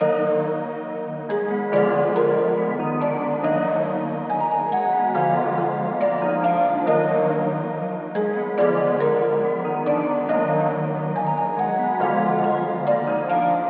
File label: dark melody